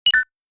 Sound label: notifying sms